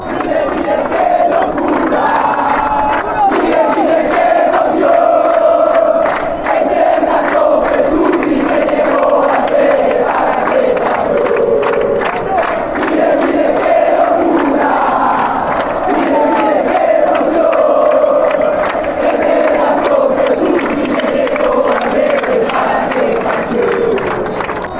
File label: atletico nacional chant